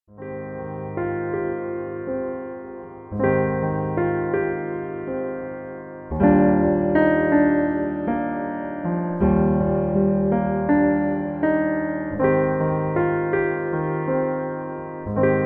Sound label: piano